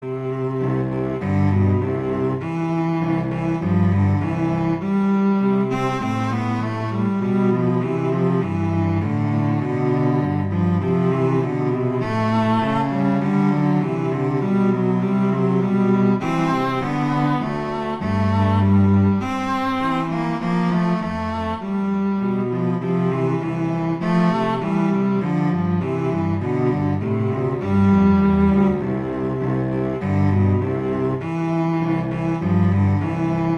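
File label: Duet, horn; Mozart; Duets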